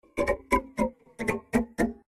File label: instrument nukulele intro